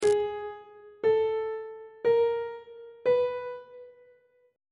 a flat 2nd intervals on treble clef

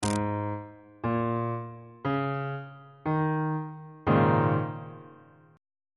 d sharp dominant 7th suspended 4th 1st inversion

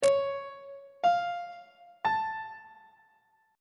d flat augmented triad chord on treble clef

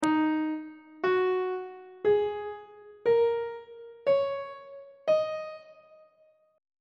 e flat minor pentatonic scale on treble clef

